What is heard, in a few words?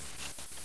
rustle; 3D Game Programming All in One